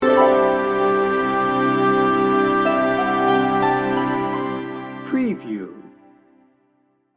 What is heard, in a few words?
piano dreaming sting bumper